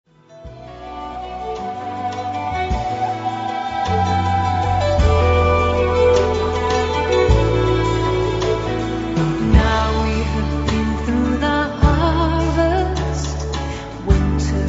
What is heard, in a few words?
celtic instrumental worship